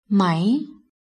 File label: rising